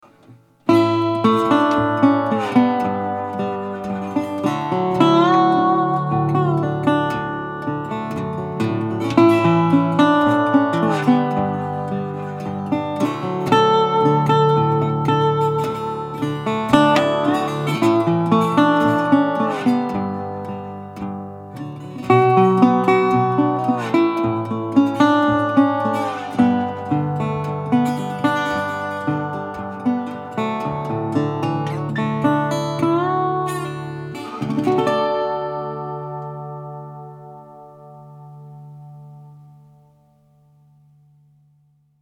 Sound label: Steel Body